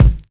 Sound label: sharp kick drum one shot g sharp key